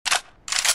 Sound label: AR Reload